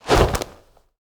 Wing Flap Medium Demon Death Underlay

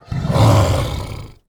Stegodon Attack

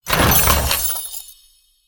Arena Treasure Chest Play

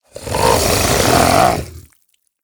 Infested Gnoll Play

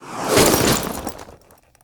Magic Carpet Death

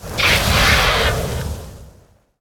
Fire Hawk Attack

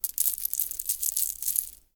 Pillager Play Underlay

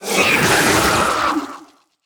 Arcanosaur Play

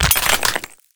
Geomancer Death Underlay

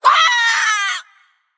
Female Beast Death

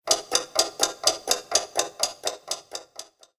Ticking Play Underlay

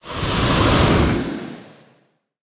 Clock Tower Soul Smoke Stop Sound

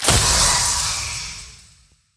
Magic Crystal Underlay Death

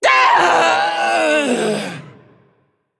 Female Dragon Death

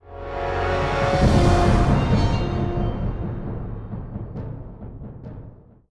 Pegasus Stinger; Horde

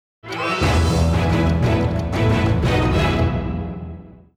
Stinger Gnome

